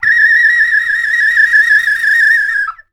bird calls, percussion

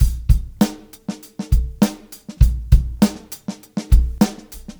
Live Beats, R&B Drums

